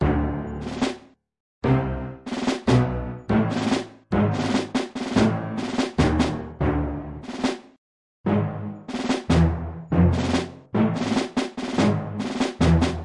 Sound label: Orchestra High Action; The Operative No One Lives Forever